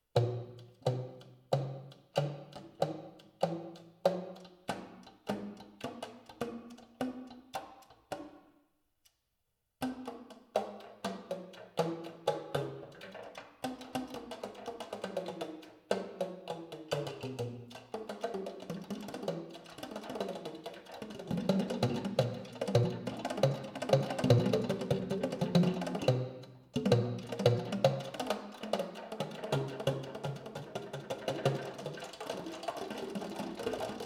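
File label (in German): ten, Klappenperkussion, Tiefster Ton b bis e1 (angeschlagen mit der F-Klappe); f1 bis h1 (angeschlagen mit der H-Klappe); freies Spiel mit Klappen (verschiedener Dichte). Tenor; saxophon; spieltechniken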